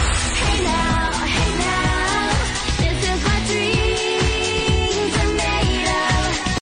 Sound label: kid clapping excitingly; audio meme